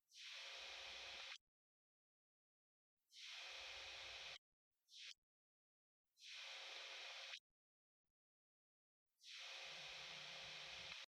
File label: Amp Guitar NR Noise Only